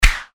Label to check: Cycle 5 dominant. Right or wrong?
Wrong